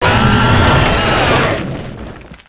death; soram

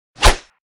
wolf human attack

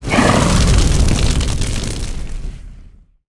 Swampmon smoke; SwampOfDarkness

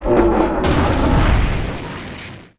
Burning Lava Golem death

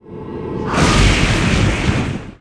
attack

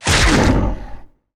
Zombie Warrior damage

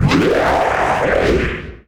Magma Magician death; IgnisVolcano

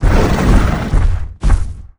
Swampmon move; SwampOfDarkness

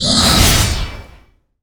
King Attack Normal; Ferea

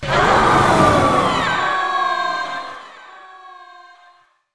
death

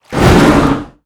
attack; Liquimon; SwampOfDarkness

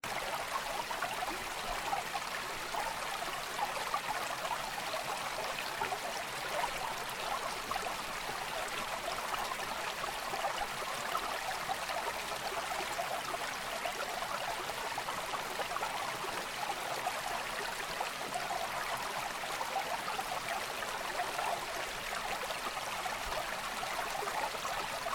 Temple env; Arenill

